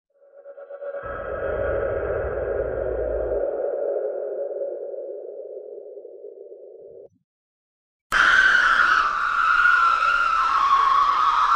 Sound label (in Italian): rumori spaventosi effetto sonoro sounds